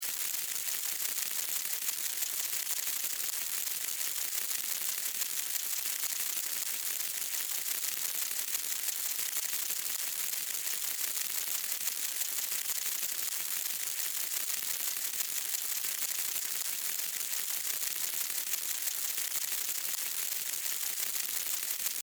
Millipede Walk, Millipede, Walk, Movement, Insect, Bug; Sound Effects:Animals:Insects; Millipedes; Blastwave